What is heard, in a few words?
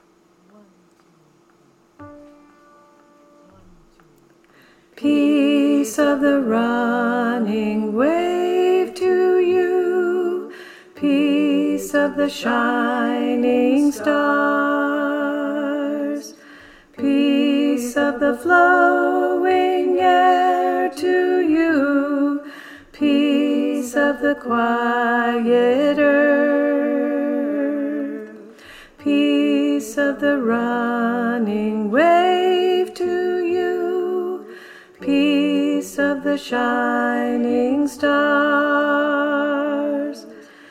Alto; The Freedom Choir